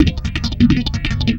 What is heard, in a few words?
Electric Bass; Sonic Foundry (Sony Creative Software); Knutrix Drum & Bass